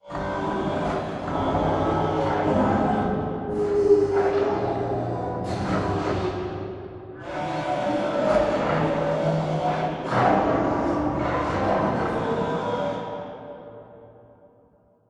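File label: Assembly Furniture Arm Distant; Boomtown; Titanfall 2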